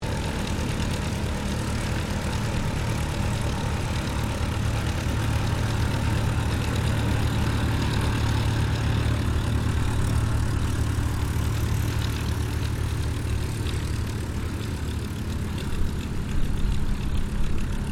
AIRCRAFT AIRFIELD AMBIENCE, General airfield ambience; Airplanes